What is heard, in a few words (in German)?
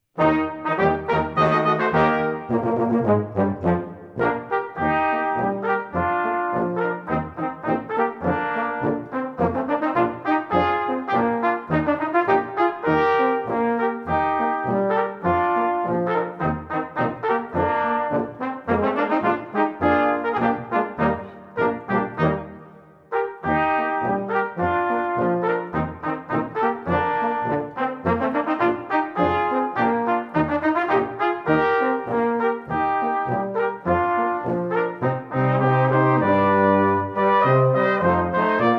Tischlinger Marsch